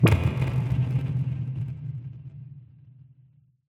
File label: amb abstract bubble impact, ambient; Half Life Model Pack